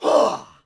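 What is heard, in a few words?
Axeman Attack Vox; Civ4 warlords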